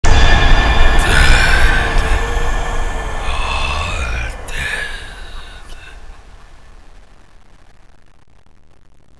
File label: umb zombie win; csco; csgo; zbm3